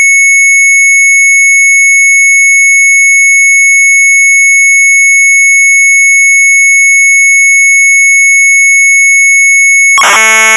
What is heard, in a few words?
Sharp MZ800 Tape; TANK-SLAG; retromelsarchive